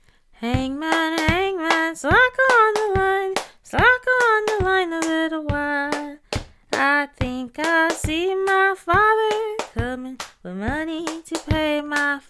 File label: Hangman Body Percussion; Black Singer and Folk Ballads